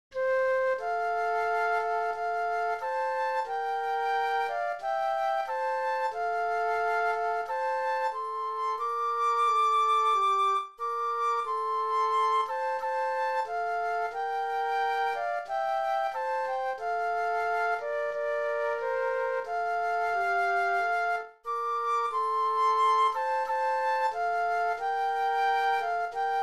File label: Flute Duet; christmas